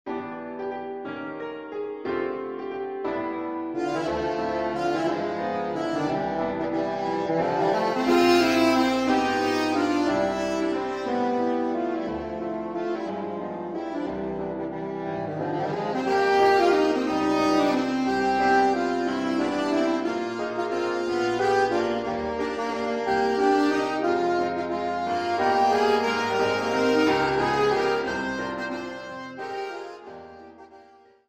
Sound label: Silver Waltz